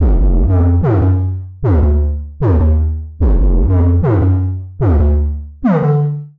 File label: Bass Eb Harmonic Minor 150 bpm, Bassline; MIDI Clips; Tonal; Monolog; Skitter and Step; Ableton Factory Packs